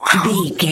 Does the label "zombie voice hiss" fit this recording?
Yes